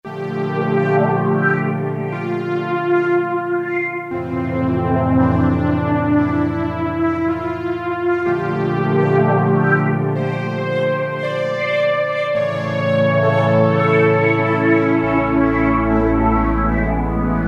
Fly-by Pad; Roland M-DC1

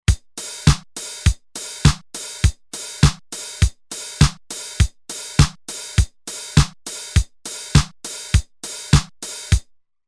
Disco, Roland TR-505; Factory Rhythms

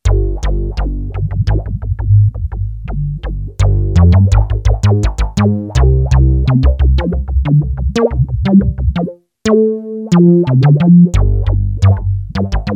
Sub Aqua, Yamaha PSR-550 Voice

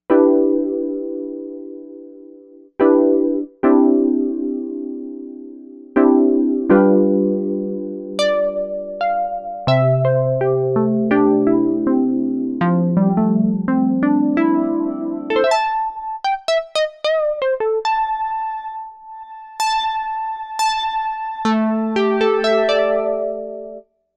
Keys, Novation UltraNova; Factory Patches; Pellaco Keys

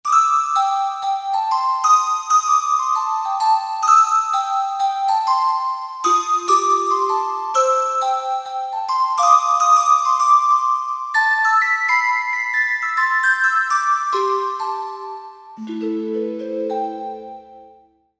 Breathy Bel

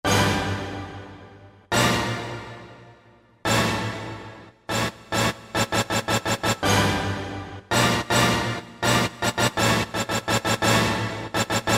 symph hit; Roland SRX-05 Supreme Dance

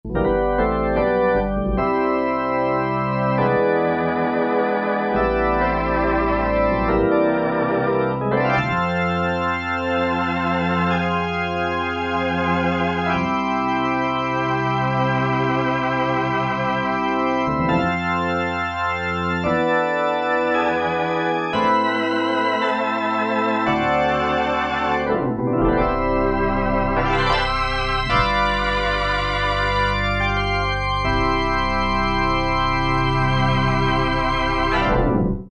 A.T.Rotary, Synth; Synth 1

